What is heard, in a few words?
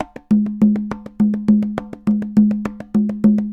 Congas, Festa Latina, Drums & Percussion